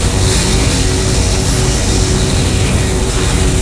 Beatophone Fire, weapons; tf2classic

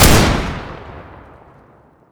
Fire, weapons; Survivalist; tf2classic

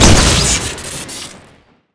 molotov launcher shoot crit; tf2classic

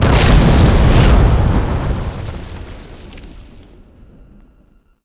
hagis explode; tf2classic

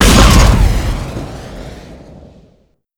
rocket shoot, weapons; tf2classic

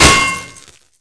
cbar hit; tf2classic

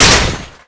metal gloves hit world; tf2classic

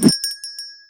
twig swing; tf2classic

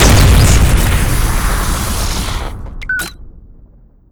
mega rail gun fire crit; tf2classic

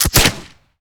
sticky jumper shoot, weapons; tf2classic

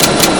minigun empty; weapons; tfc; tf2classic

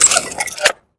world reload; weapons; insanerator; tf2classic

